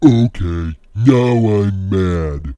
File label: death; quake2; ogro